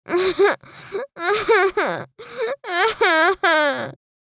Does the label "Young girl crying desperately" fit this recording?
Yes